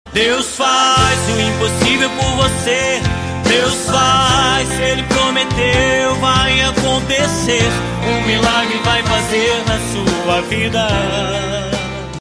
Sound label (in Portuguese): toque